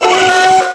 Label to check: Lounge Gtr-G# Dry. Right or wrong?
Wrong